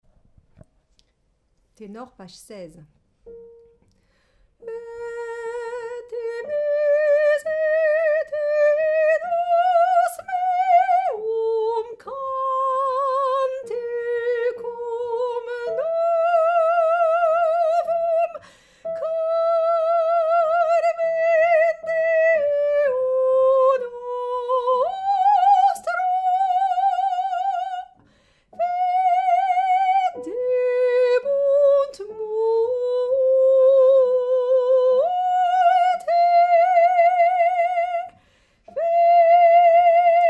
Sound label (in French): psaume Tenor